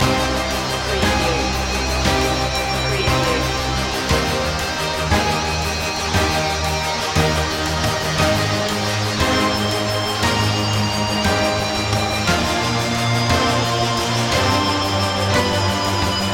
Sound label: electric guitar waltz loop